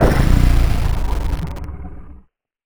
Powerup Dark Gurgle Trigger

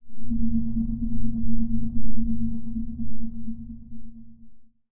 Sound Scary Pit

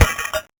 Powerup Throw Away

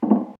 Step

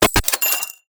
Coins Brief Case

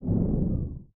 Fan

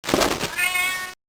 Sound Powerup Cat Treats Trigger

